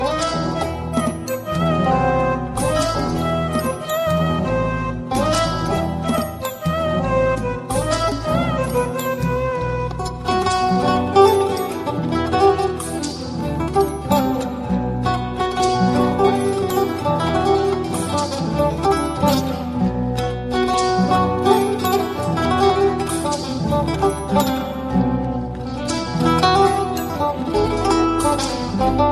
Guitar Ney

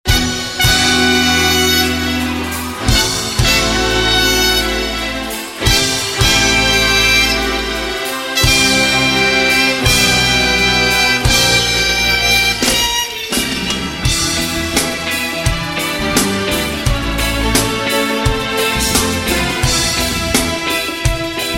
Concert

